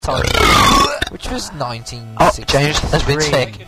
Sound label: farts